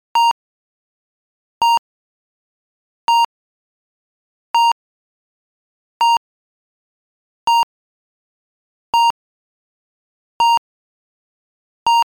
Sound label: Emergency medical kit heart monitor beeps